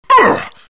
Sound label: pain; dota2